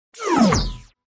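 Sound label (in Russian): звук выключения системы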